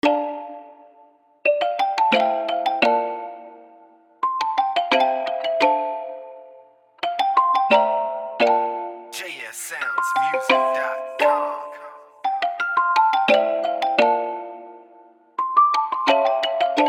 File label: Piano Vibe